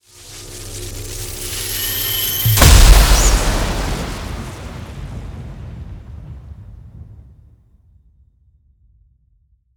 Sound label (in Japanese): 雷系 魔法音